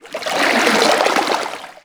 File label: water